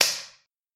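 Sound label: sounds of a high five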